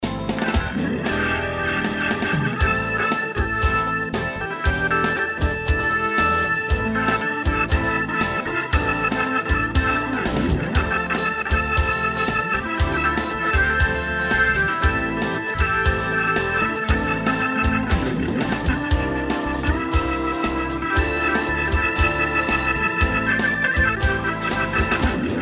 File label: blues